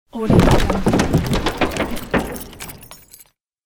attic garbage fall; domestic sounds

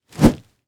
whip whoosh; transitions and movement

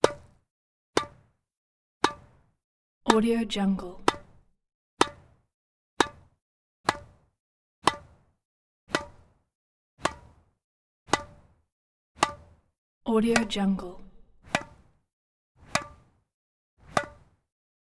sports tennis tennis ball hits hard; human sounds

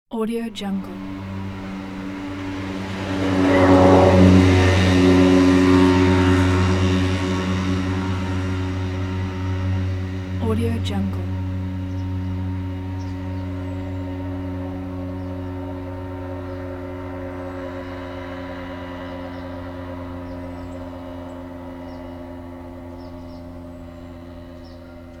small motorbike long passing through village; urban sounds

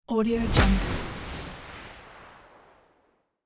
transition airy wood hit medium; transitions and movement

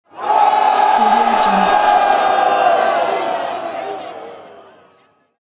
people cheering screaming; human sounds; جمعیت در جال جیغ و فریا

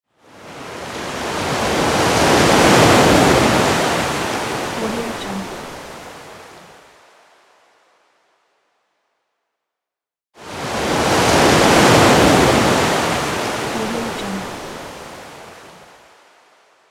sea wave

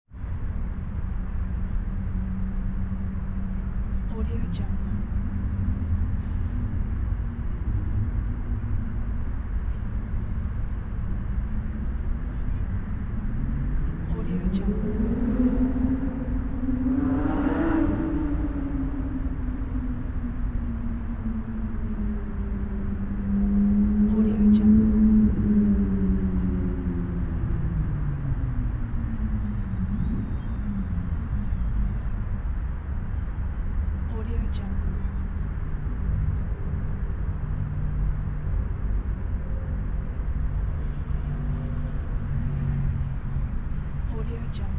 city daytime ambience from a medium mound; urban sounds

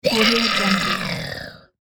zombie scream snarl

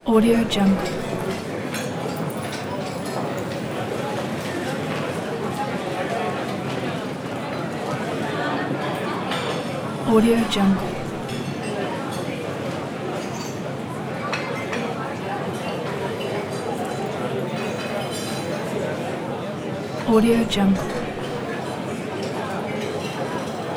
airport restaurant ambience; urban sounds

